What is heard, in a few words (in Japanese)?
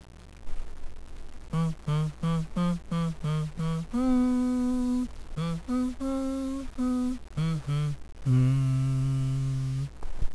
hanauta